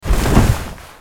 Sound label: body lobe rolling